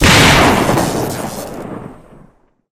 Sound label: mon explosion; russian sounds